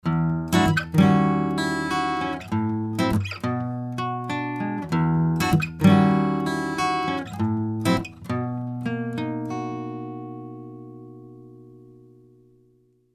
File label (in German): Shelford Channel Western Gitarre neutral; Rupert Neve Designs